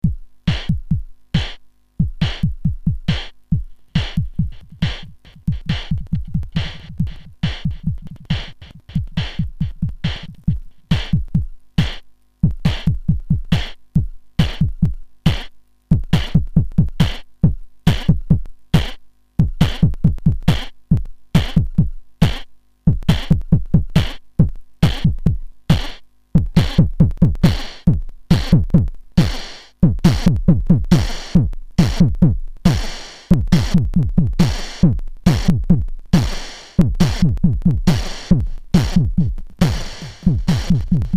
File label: beat; Anamono